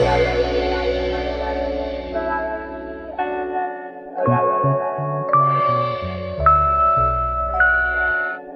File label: guitar and keys, Loops, Phonky